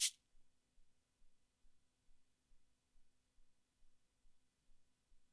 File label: Cabasa, Drum Machines, ELKA X1000; 300 Drum Machines